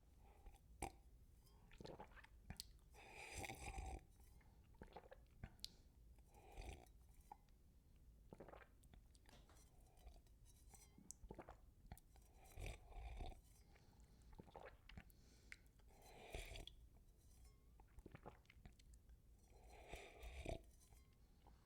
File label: wine glass sip